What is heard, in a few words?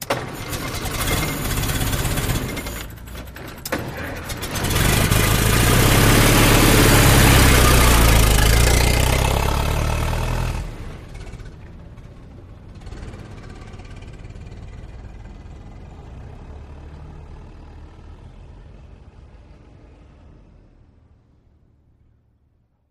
Model-t, Start And Away; Engine False Start, Sputters, Restarts And Accelerates Away, Gear Shift And Distant Away.; Ford Model T